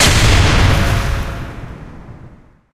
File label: semi distant explosion; Source Engine; Portal 2